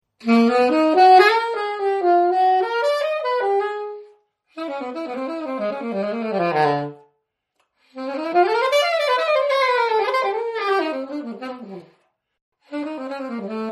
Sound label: Alto sax